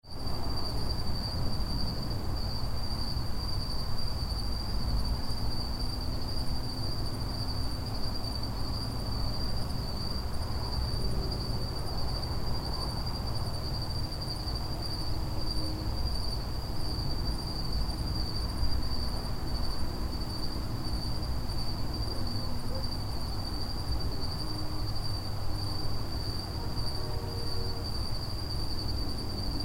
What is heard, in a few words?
Ambience Suburban Night